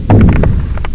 momma step

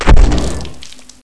bullchicken bite

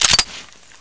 smg reload

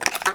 rifle reload

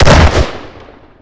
shotgun fire

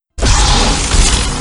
lightsaber on; kylo

